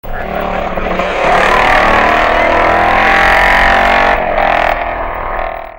Thruxton Velo; 1967 Velocette Thruxton

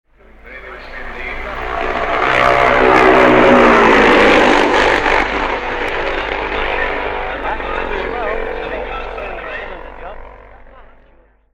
G50 Matchless

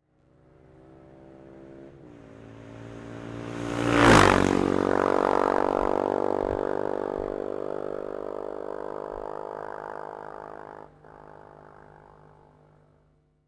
BMW F650CS, bike

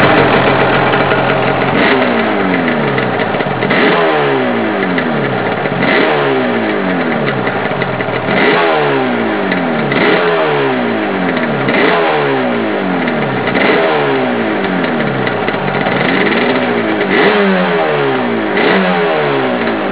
Yamaha RD500, bike; 1984

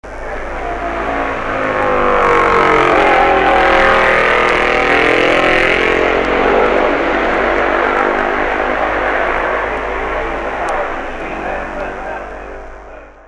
AJS

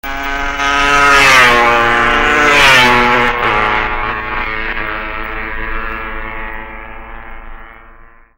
Bultaco; 1967

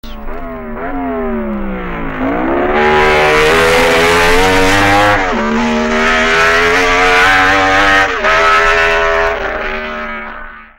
URS

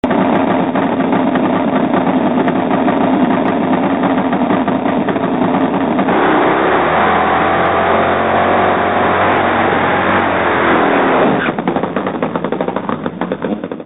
Burn Out, bike

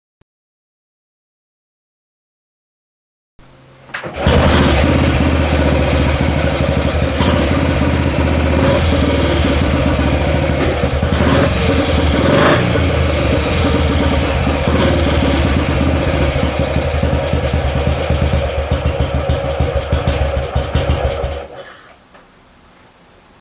Matchless G2; 1964 Matchless G2 CSR

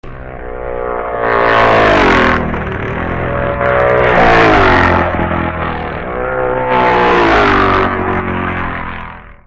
Matchless Norton; 1967

